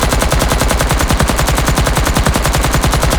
Turret Loop; weapons; Lasrian APC; Warframe

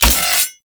Micro Spin Attack Hit; Warframe; Corpus; Arachnoid Micro; Abilities

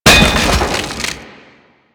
Wood Bench Destroy; Ambience; Props

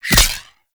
Ghost Expel Spirit Impact

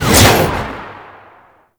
Jet Turbine Pistol Secondary Shot Release

